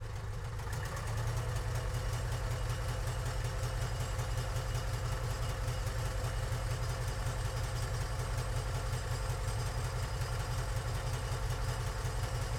Infanterikanonvagn 103 ONBRD IDLE Various Off ENGINE Rear, Assault Gun; Assault Gun IKV 103; DPA4062; Pole Position Production